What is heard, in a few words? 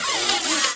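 droid attack light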